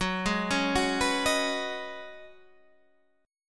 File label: Db 7th Suspended 2nd Suspended 4th Gb